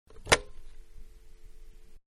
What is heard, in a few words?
Pick Up, Telephone-Pick Up; Telephones (Sound Effects:Electronics); Manhattan Production Music